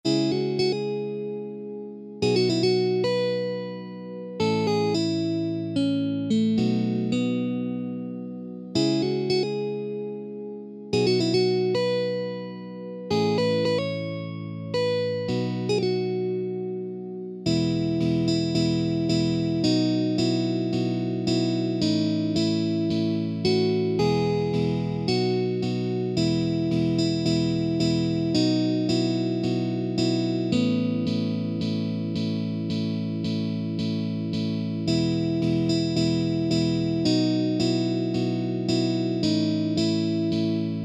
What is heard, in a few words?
Fast Tempo, guitar